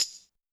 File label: Pop Tambourine, Percussion; Pop Rock